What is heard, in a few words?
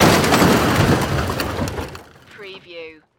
Crash Metal Plastic